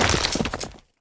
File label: skeleton big die; wesnoth